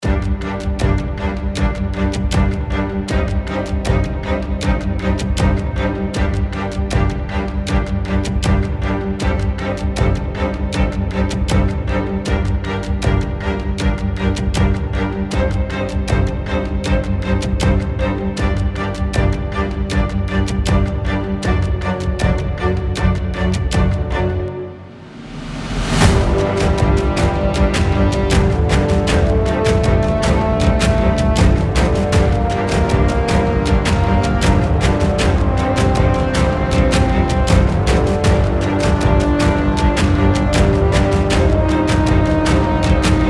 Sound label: Orchestral Drama